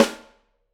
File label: Piccolo